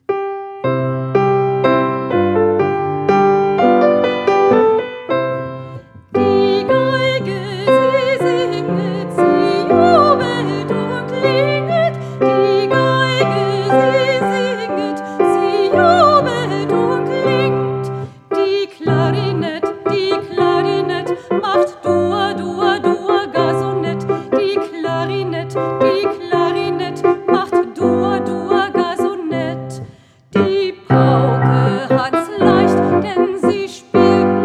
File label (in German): Orchester komplett mit Gesang